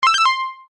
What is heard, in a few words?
UI Rollover Major, User interface feedback sounds